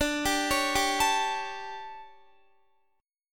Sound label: D Major 7th Suspended 4th, Guitar; DM7sus4; arpeggiated; JGuitar